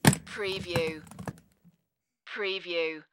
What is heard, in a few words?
Ski Binding